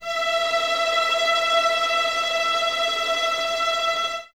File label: VS E 5 Tremolo, 11 Violins; Miroslav Vitous